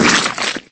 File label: dunk slime; nickelodeon basketball stars 2015